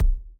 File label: Ball Player Hand Hit Tackleball Light